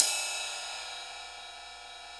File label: RIDE, Drum Dry; East Collexion; Drum 1 Dry